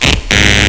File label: wrong; firearms